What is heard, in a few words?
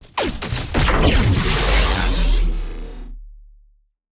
Sci-Fi Energy Explosion